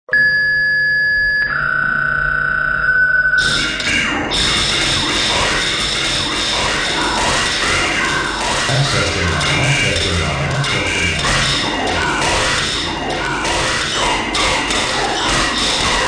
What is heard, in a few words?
drum machine gun